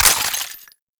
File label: Ammo Pickup Default; Warframe; Frumentarius; Ammo Ability